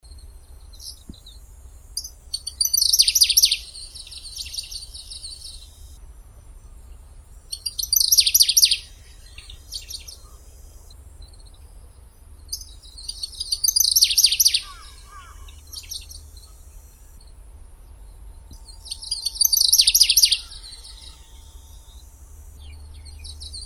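Middendorff's grasshopper warbler, Locustella ochotensis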